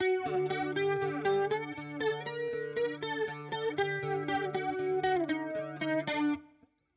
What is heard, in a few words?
Walrus Audio Polychrome Clean Voice Switch Down